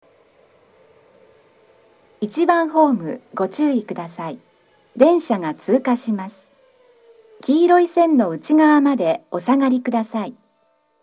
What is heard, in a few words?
pass, inariyama koen, station